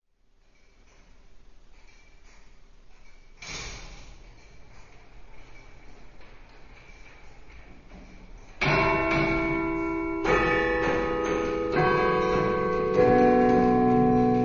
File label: bells, courthouse